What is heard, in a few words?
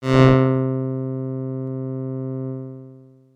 adsr